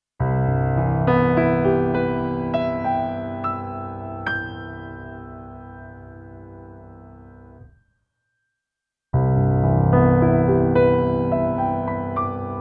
Nord Piano 5 White Grand Piano Timbre Bright Mid Soft